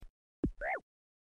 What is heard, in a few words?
man hit sound effect; runescape